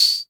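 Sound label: CR78 Tambourine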